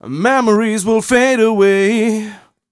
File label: m 127 mel a, Vocals